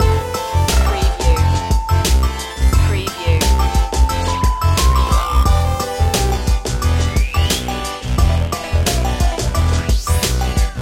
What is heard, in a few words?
retro video game kazoo world loop